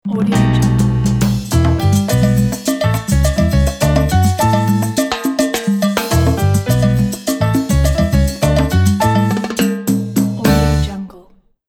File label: happy piano latin